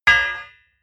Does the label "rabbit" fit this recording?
No